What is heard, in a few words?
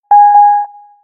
warning